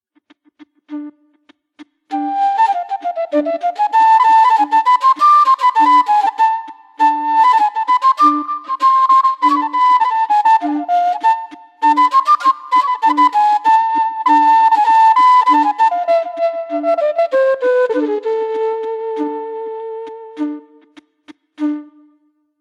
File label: quena